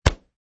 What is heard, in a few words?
Slap Noise; Clothes Slapped noise - Variation 4; Wrestling and Struggling; Combat; Michael Huang